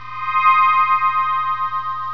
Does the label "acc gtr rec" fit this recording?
No